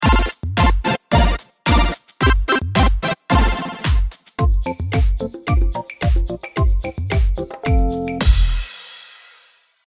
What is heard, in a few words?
victory